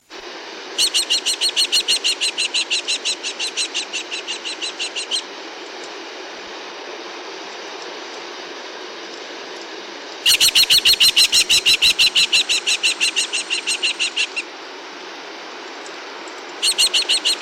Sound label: New Zealand Falcon